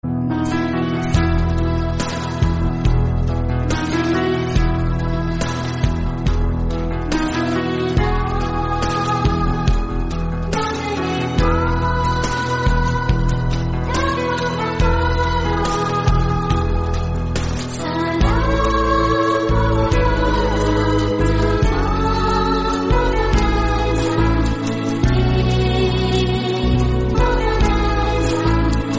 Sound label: tamil nisarisa